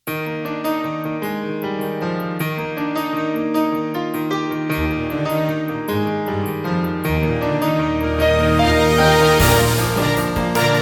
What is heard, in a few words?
Orchestrated, jingles